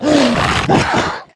Goblin Captain die; Seer; GamigoNA

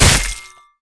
Dmg Axe Bone

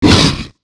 Chimera Damage; Seer; GamigoNA

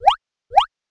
Mandragora Walk; Seer; GamigoNA

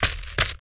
run

